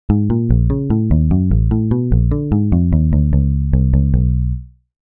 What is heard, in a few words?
atonal jam; algorithmic jams